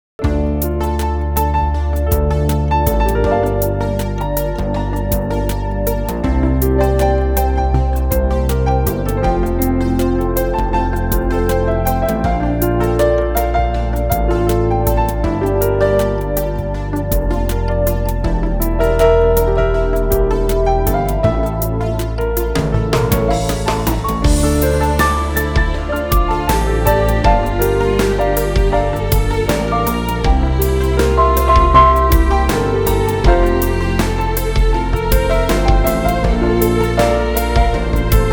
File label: piano